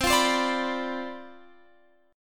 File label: C Major 7th Suspended 2nd Suspended 4th C down Guitar Open F, chord; CM7sus2sus4 strummed